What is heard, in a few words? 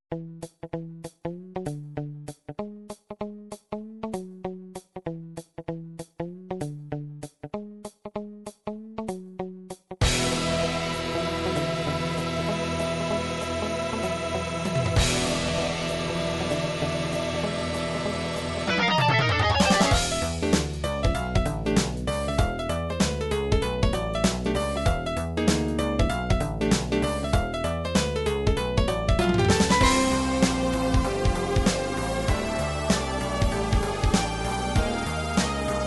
jazz, midi